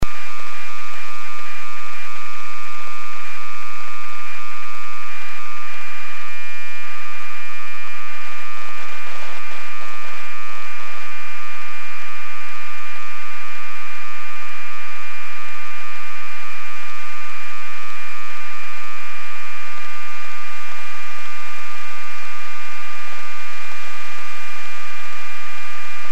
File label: beacon, amsat